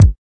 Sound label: kik